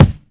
2000s clean kick sound d key, kick tuned to the D note. Loudest frequency: 589H